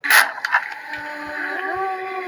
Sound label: jumpscare; cooking idol